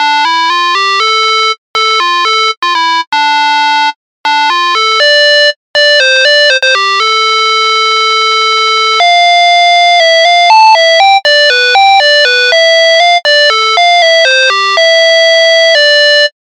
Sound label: ringtone